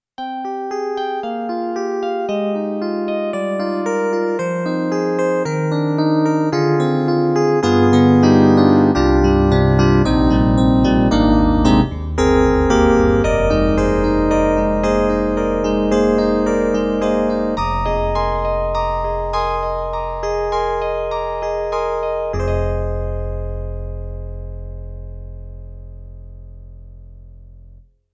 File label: YAMAHA MU90 Electric Piano 2